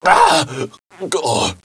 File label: gargle; player